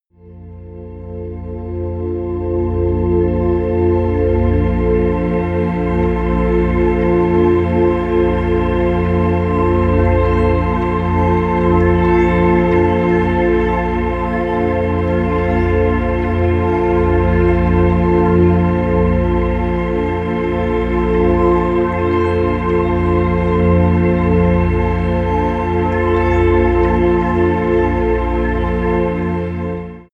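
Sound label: morning air, pads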